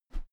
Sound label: whoosh feather slow; swooshes-swishes